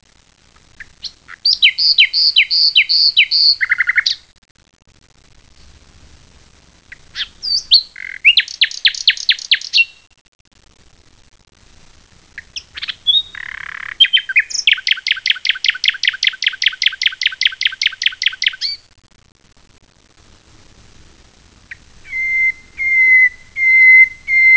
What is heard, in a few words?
Nightingale; Slavík